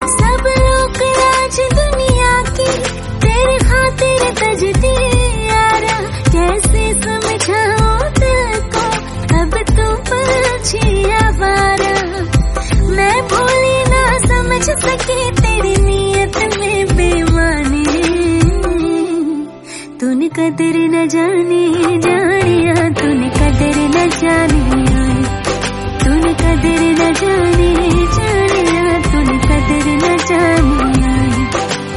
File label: Hindi Song